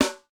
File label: snare, snare house